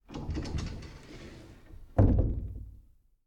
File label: door open; Golden Crayon Sounds